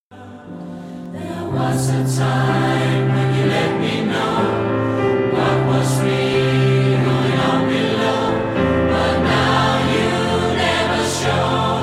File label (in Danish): Halleluja